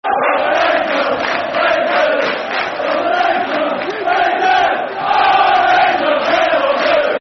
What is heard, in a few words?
We Love You Rangers, Football Chants; Rangers GRFC